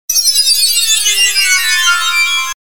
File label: move down sharp high